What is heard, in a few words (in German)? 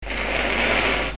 fauchen, Geparden